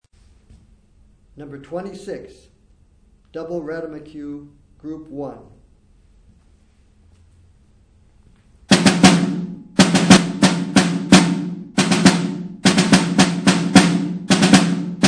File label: snare rudiment